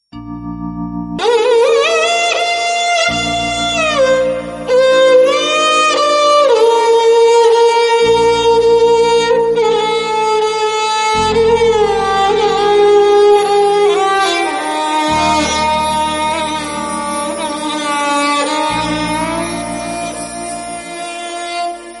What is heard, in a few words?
Sarangi